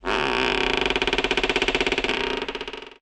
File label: tree bend; the wolfs night